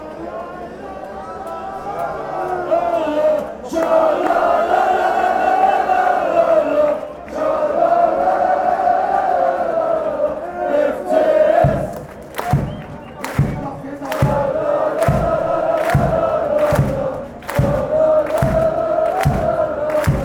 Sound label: shalalalalalalalalala, fanchants